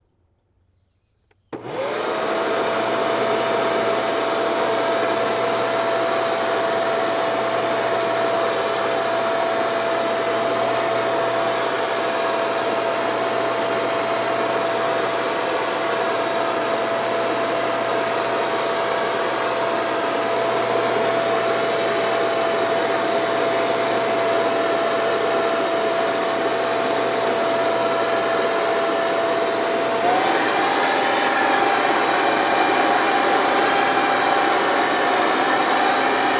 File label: leaf blower